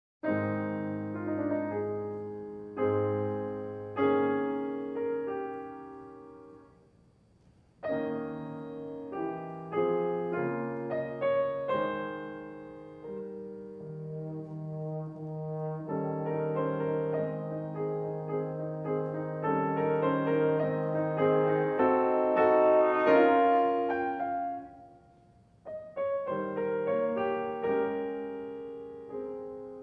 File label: Sonata in E-flat Major, Larghetto, horn and piano